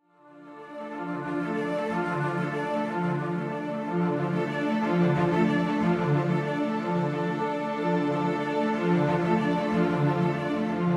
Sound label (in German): Majestic Movement Kombi Long Arpeggio Full ohnehinten mit Melo